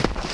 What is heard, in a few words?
sand footstep; minetestforfun game; nalc server